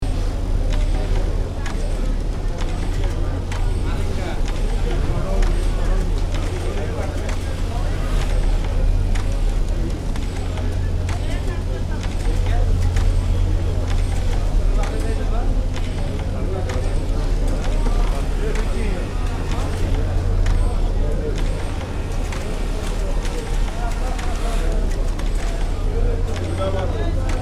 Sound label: Refinery factory inside perspective people chatter loop